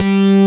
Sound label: SYN BASS; Pro-Rec - Synthsonic; TRINITY